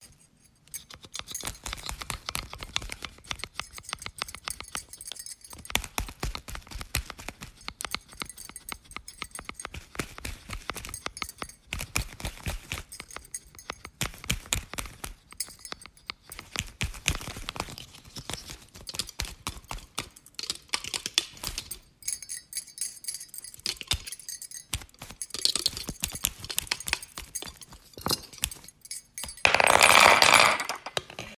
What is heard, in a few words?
three objects together